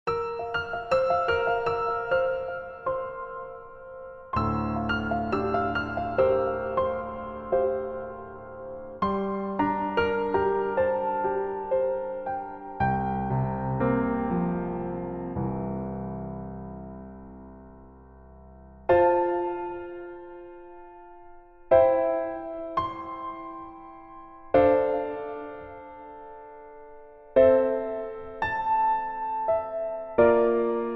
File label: Piano ver